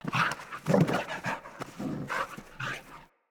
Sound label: Pack Wolf Run Grunt; Animals